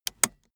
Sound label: car seatbelt unfasten soft